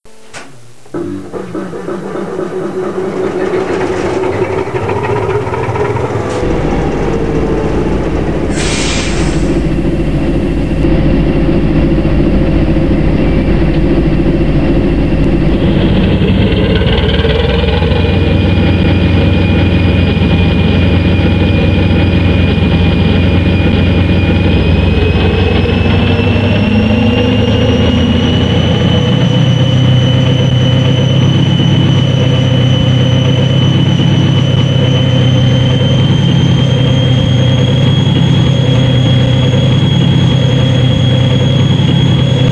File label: SM42 Sound